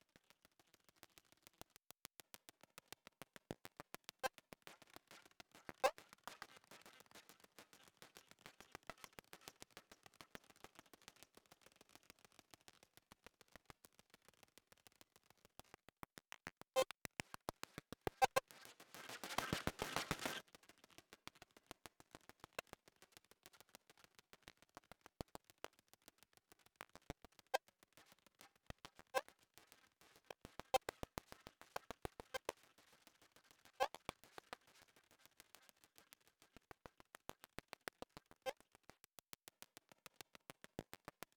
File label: Music NOISE MOVE; Music B1 NOISE MOVE Phase02